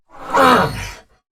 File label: Attack Effort